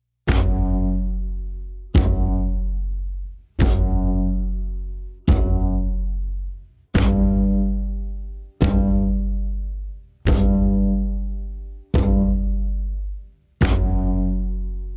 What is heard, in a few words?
Mountain Music Diegetic Beat Layer1